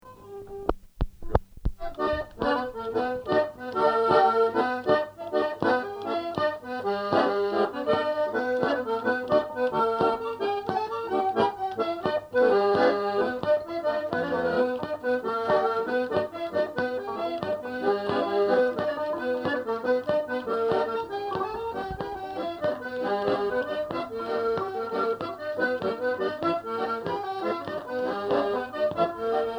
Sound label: jig